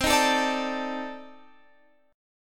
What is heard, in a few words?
C Minor 6th Add 9th C